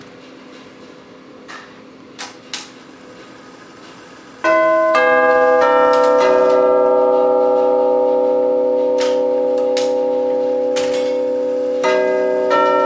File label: chime, clock; jacques